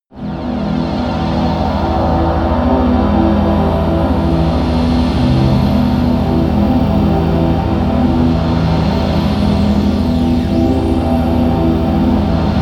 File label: strange clouds, music elements